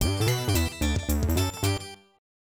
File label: Round Clear